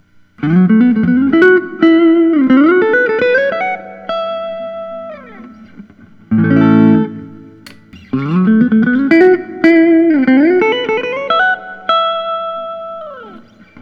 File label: chambered 56, guitars; gibson custom; les paul reissue; lemonburst